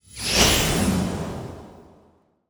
Designed Fire Spell

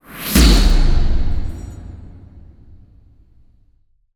Whooshy Bang Boom Transition

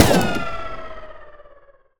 Heavy Gunshot Weapon Firearm

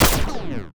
Modern Silenced Gunshot

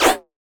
Percussive UI SFX

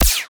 Laser Gunshot Single

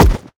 Close Combat Heavy Body Hit Impact

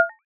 Soft Tap Click Touchscreen

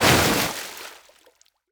Water Throw Pour Large Quantity

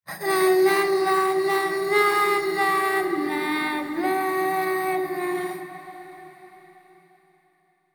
Designed Horror Lullaby

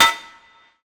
Metallic Strike Impact Hit

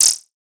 Casual Comic

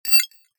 Glass Clink Soft Contact

